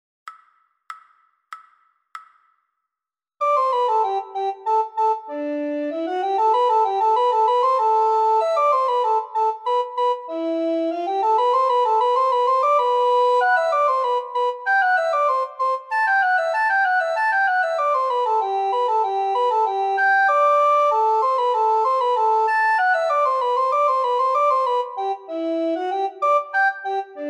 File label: descant, recorder